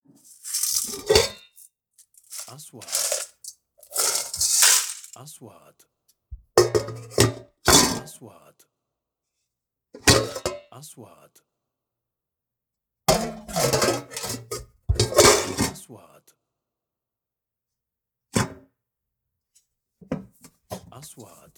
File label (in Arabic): معادن